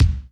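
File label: GOGO kick, GO GO DRUMS; Crypton - DrumBase5000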